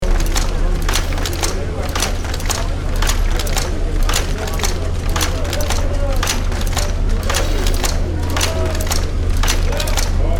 Warehouse ambience industrial machines working loop